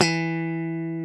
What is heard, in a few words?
GTR Funky Strat; 90's Sample CDs; Club-50 - Foundations Roland; Funk & Mute